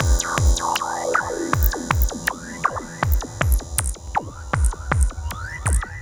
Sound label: Noises off, Synchro Funk, Beats; Sonic Foundry (Sony Creative Software)